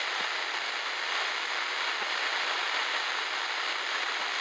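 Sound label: item static loop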